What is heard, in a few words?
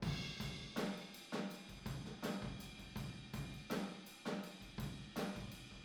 Boogaloo Chorus Room ST, 82 BPM